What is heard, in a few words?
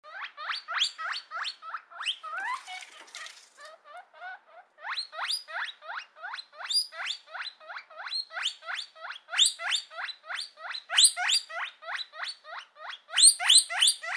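guinea pig making sounds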